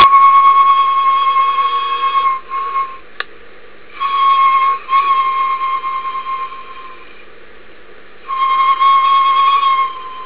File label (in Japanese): fue; tominosawa; jomon